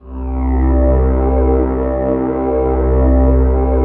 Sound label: pad, Drum & Bass, 160 BPM